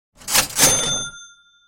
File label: cha ching